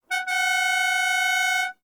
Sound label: ferrari308 car honking dual air horns; prosoundfx